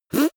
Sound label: beatbox effect suck in